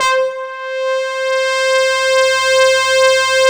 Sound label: SYNH, SYNBRASS; Synthesizers